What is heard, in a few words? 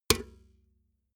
metal bucket hit bottom